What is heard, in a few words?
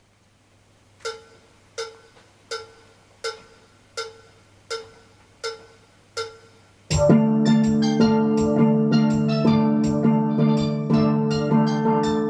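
GUITAR Intros + Refrains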